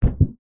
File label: vehicles car bonnet hood pressure press hit bend footstep single